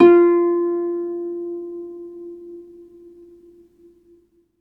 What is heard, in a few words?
harp sus; ILIO; Synclavier Strings